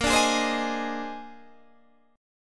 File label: Bb 11th, Guitar, Open D